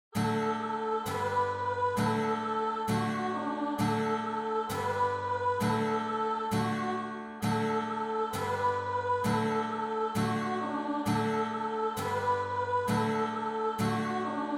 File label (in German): Backe backe Kuchen